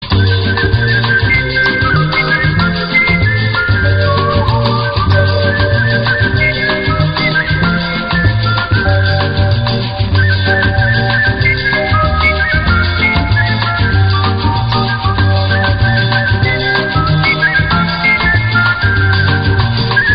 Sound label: whistler, Whistle; Boss